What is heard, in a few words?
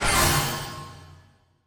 role Lv Up